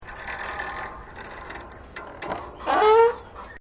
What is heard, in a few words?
Door Wooden Gate Old Squeak Open; Various doors; Gates